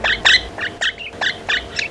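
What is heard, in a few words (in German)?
zarte kontakt, Katharinasittiche, Lautäußerungen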